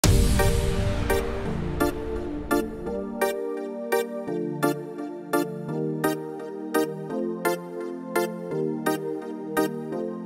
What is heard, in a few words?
balkanik reggaeton instrumental music